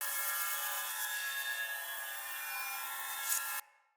Short Sword Thrown Idle Loop; Weapons; Player

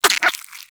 Vocal Tranq Grunt

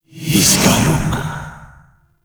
Alt Fire Whispers; Grimoire

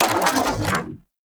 Arch Gun Primary Beam End; Weapons; Warframe; Archwing; Hildryn

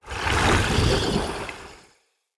Dendrit Staff Caster Proj Whizz By; Weapons; Staves

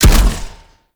Grenade Launch; Warframe; Nechro Mech; Abilities

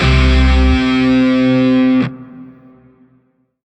Shawzin Yo Chord L; Music; Grineer Shawzin; Warframe

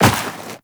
Walk Snow, Footsteps; Warframe; Tenno

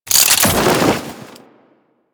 Turret Throw; Warframe; Corpus; Corpus Railjack; Admiral Agent

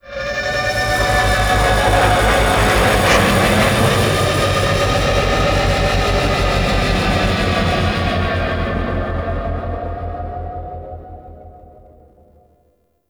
Level Docking; Vehicles; Warframe; Liset; Nora Liset

